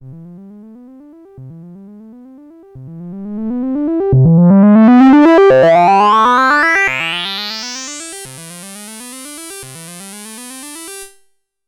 12dB SVF 6dB BPF Sweep Dist; GRP Module

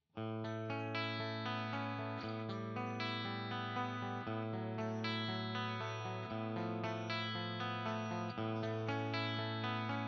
HB Fusion-T clean bridge split

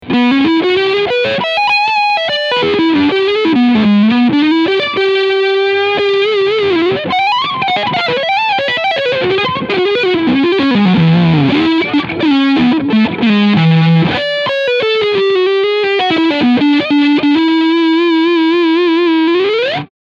Leadsound Front PU

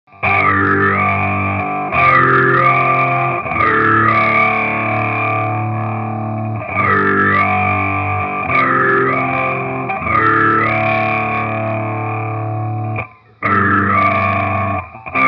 Electro-Harmonix Stereo Talking Machine

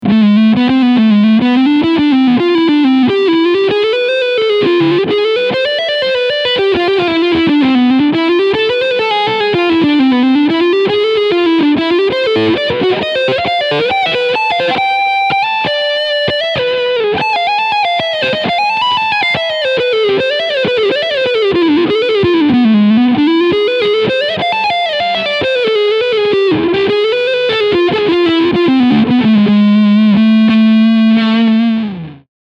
SC Custom Plus EMG OCF Lead Sound Front PU; Harley Benton

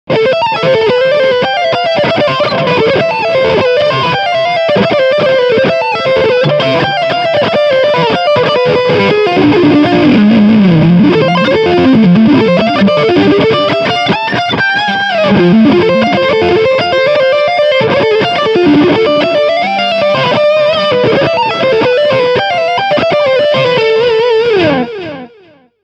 Prophesy Lead

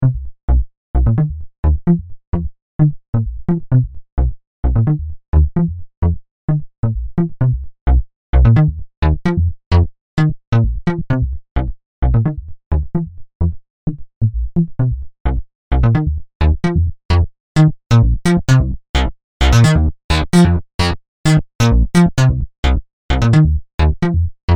blue bass

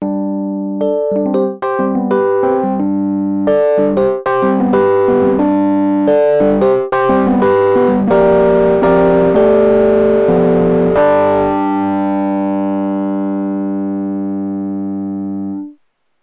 Elektron Analog Heat, Mid Drive Rhodes

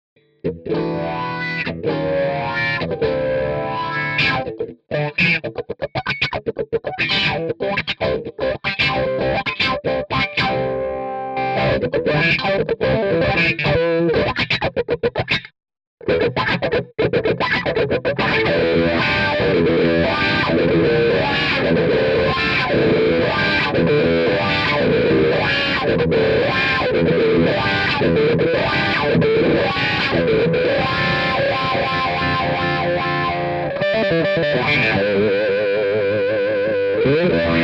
Strat OD Wah Pedal

